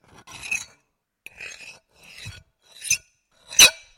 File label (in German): Kratzen auf dem Teller lang; Geräusche zu den Klangexperimenten